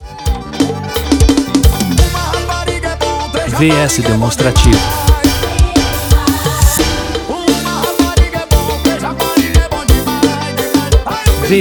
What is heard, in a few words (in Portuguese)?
forro piseiro